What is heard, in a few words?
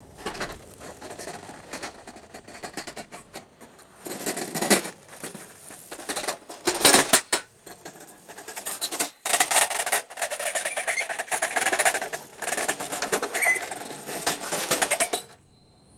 metal wagon rolling over grass and stopping; vehicles